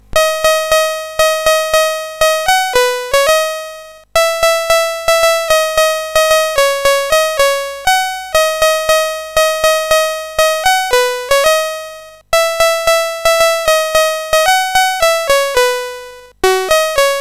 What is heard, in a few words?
Jingle Bells; CSG8580R5; sid